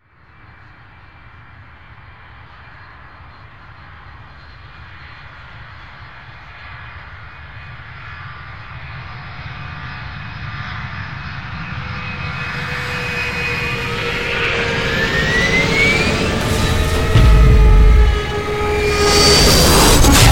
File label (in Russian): Крушение падение пассажирского самолета